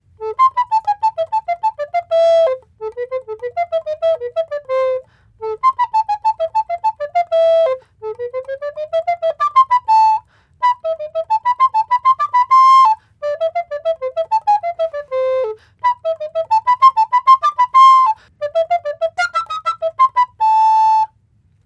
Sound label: Yamaha YRA402 B Ecodear Alto; Yamaha YRodear Alto